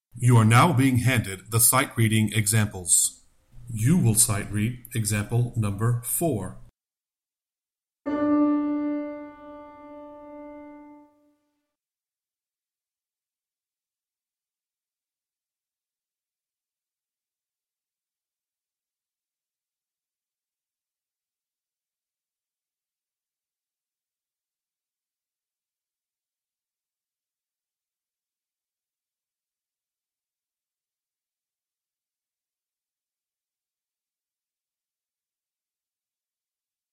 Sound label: Sightreading Example Alto